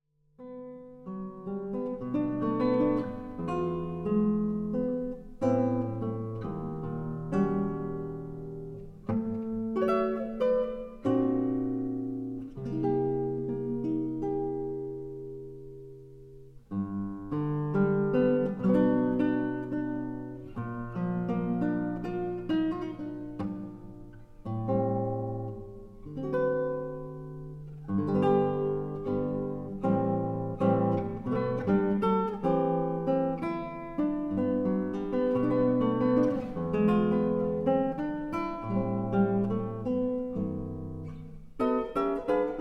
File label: my guitar story